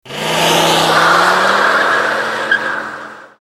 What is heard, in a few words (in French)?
Demarrage + grissement de pneu